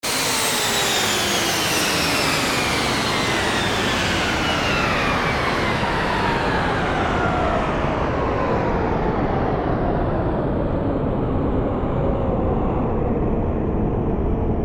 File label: boost power to standby total shutdown